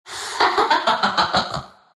laugh; dota; phantom assassin

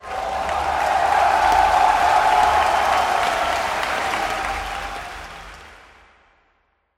cheer; radiant; dota; spectator

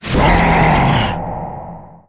nether strike in; spirit breaker

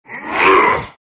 shards grunt; terrorblade

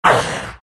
big pain; Undying

